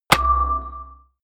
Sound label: medieval click medium negative